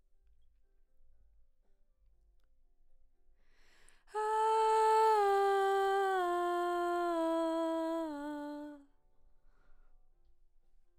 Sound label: BG Vox